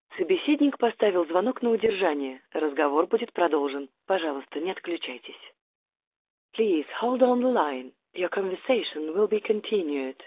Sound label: telephonn robot